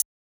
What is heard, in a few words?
Hihat